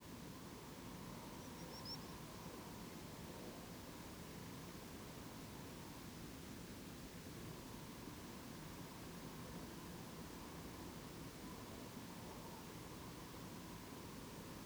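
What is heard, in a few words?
Common Sandpiper and Nightjar; Sunnyside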